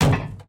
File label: ghost device hit ground